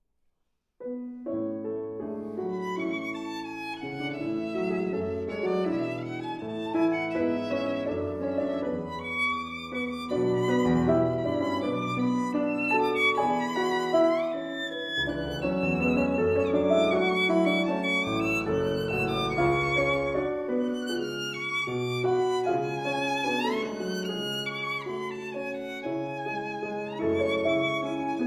Sonata For Violin and Piano in A Major, Allegretto Poco Mosso